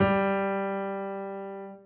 soft hit whistle; osu!

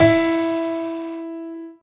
normal hit whistle; osu!

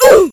soft hitwhistle; osu!

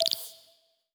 deck ui tile scroll; steam; steamui

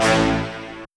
soft hitwhistle; osu!

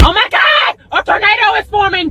drum hitnormal; osu!

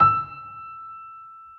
soft hit whistle; osu!

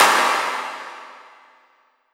soft hitclap; osu!

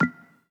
ui bumper end; steam; deck

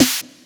normal hitclap; osu!

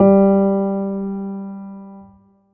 normal hit finish; osu!

